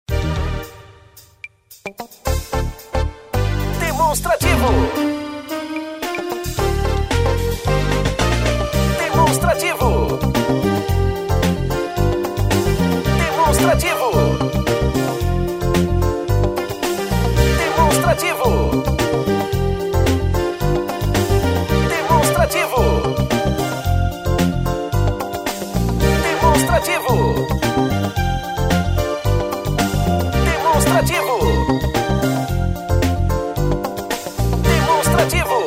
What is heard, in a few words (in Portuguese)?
tema espera